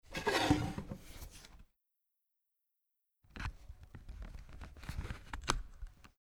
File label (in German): Gartenset Schaufel raus rein